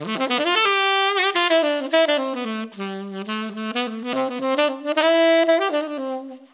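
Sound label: 12 beat D major 110; Ramblin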